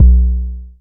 analog tonal, Kickbank

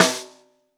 Bottom, Snare; Ableton Foundation FreePacks; Snare Designer

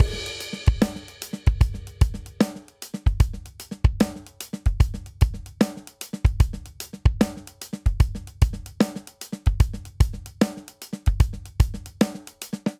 Triplet Hip Hop Verse 75 bpm; 4 4; MIDI Clips; Ableton; Factory Packs; Drum Booth

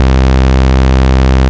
Lead in, Bass; Instruments; Basses; Ableton; Creative Extensions

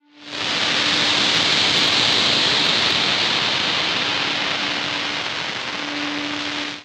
Noise Rain D 140 bpm; MIDI Clips; Tonal; Percussion & FX; Skitter and Step; Ableton Factory Packs

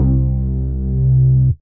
Bass, Operator; Voyage Bass

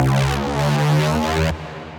Bass; Ableton; Skitter and Step

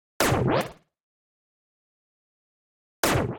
Siren D 170 bpm; Ableton; Factory Packs; Skitter and Step; Hit It

